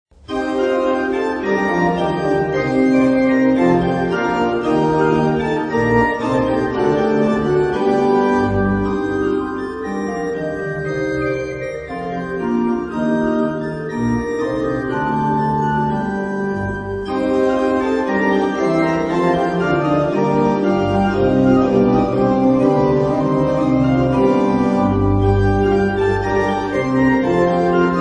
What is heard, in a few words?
organ; francisque